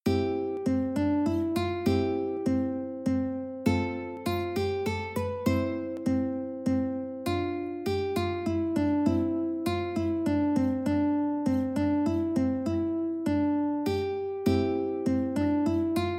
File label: ukulele